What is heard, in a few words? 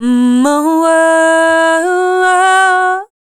female dance vocals